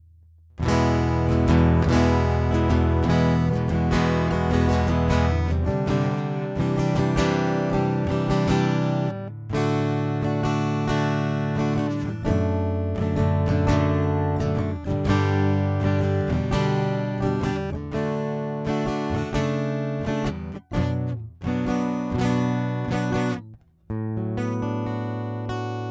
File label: piezo